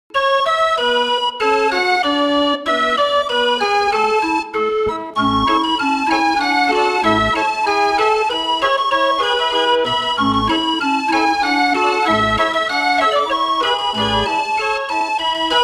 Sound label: Keyboard Instrument; Assassin's Creed Syndicate